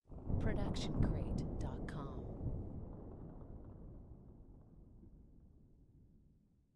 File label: Deep and Far Explosion; SoundsCrate